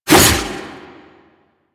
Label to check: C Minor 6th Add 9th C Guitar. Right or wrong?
Wrong